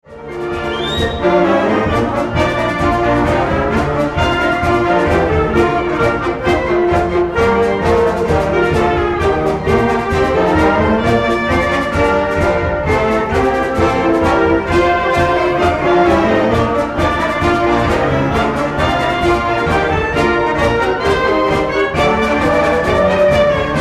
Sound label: victory song; lehigh